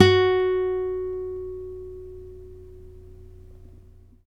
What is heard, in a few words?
NYLON P F#3, GUITARS; Synclavier Sampler Library